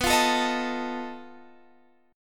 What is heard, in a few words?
B 7th Flat 5th B Guitar Half Step Down